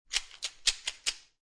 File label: Poker Chips Rattle Chips In Hand; Gambling; Casinos